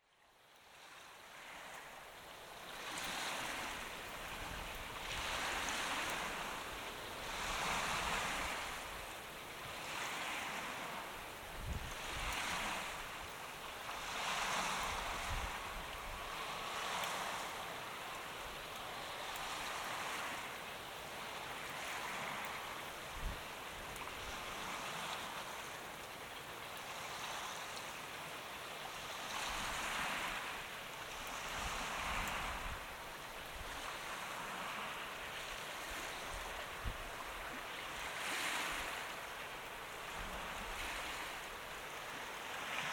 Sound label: Herons at night